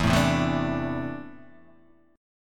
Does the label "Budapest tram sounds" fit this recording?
No